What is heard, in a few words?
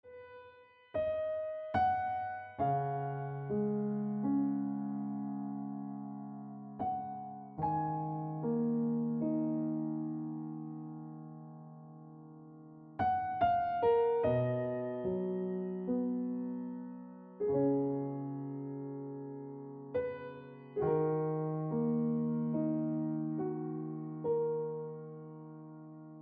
Piano Music